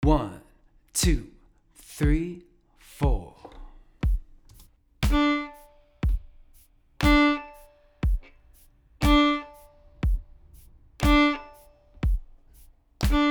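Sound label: offbeat accents quarter notes open d, violin; offbeat accent patterns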